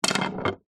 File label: sport pool snooker cue lay down wood surface